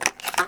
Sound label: shotgun reload